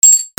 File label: MONEY